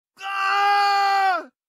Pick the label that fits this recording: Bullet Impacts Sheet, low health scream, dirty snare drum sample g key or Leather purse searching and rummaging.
low health scream